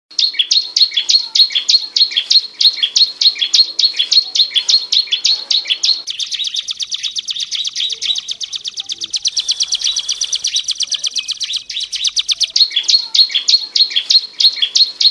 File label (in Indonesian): kombinasi cigun daput